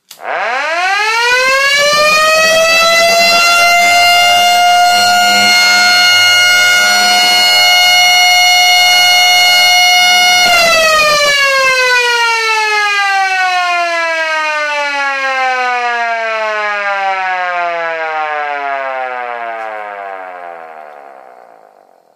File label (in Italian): sirena